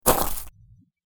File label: footsteps on gravel